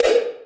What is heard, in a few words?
dial